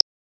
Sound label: hover